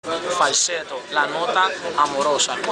falsetto

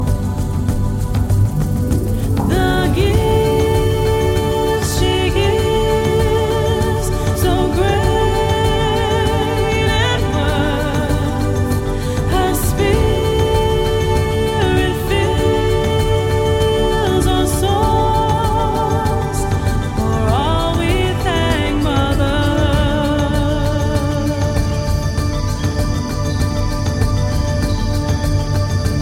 native american tune

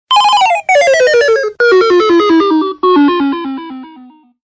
Trap Mix

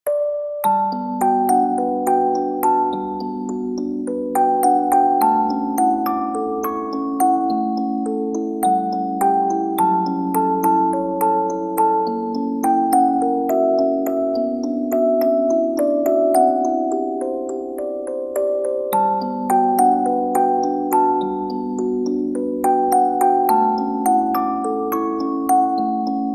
Music Box

